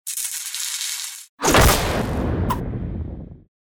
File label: outro azul sfx; without music